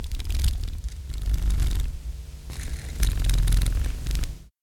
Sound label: butterfly